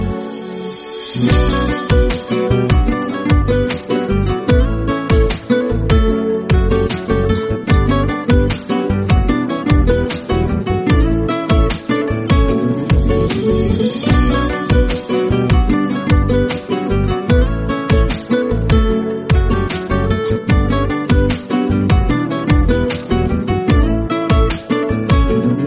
guitar irani tone; ringtone

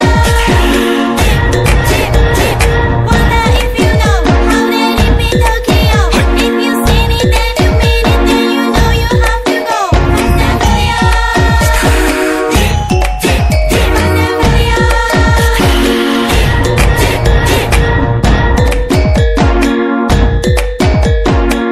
ringtone

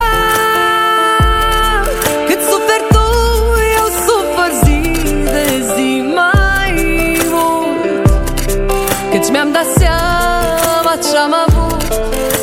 super cool romanian ringtone

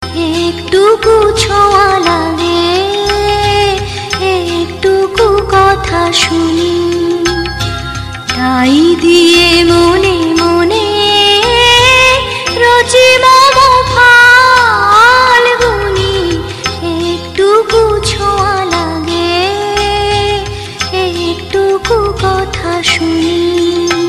bengali female tune; ringtone

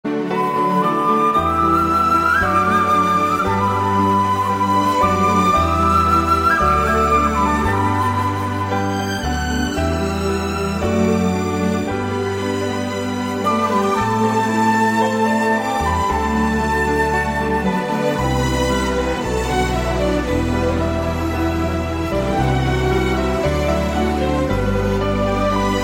chinese flute

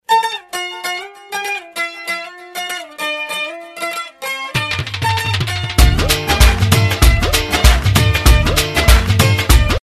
Punjabi folk ringtone

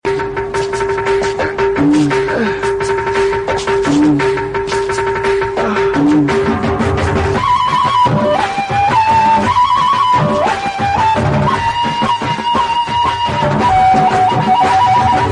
flute; judwaa

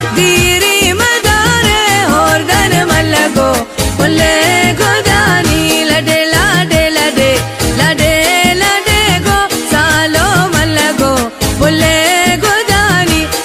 irani wedding, ringtone

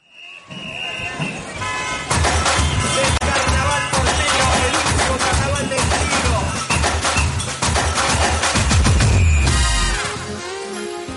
turkish latin pop ringtone

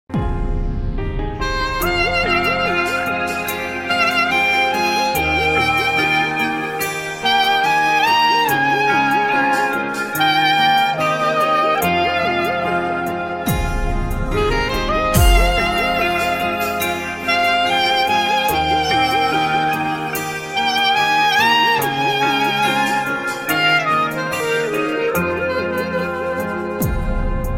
arabic tone

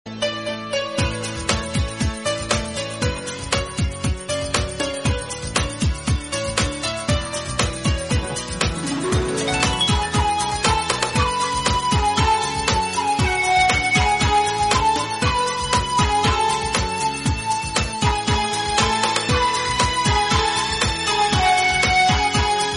instrumental

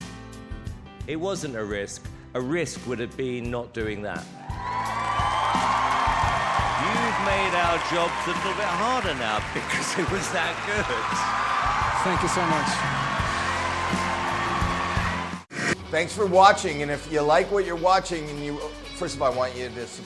sweden ringtone

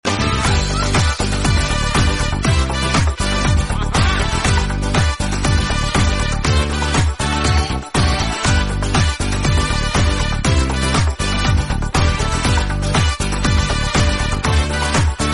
reggae remix ringtone

